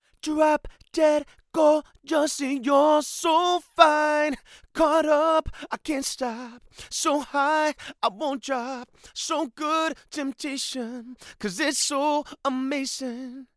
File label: male vocals, tube; lewitt